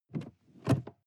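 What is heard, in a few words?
sports car interior seat lock; verkehr transport; autos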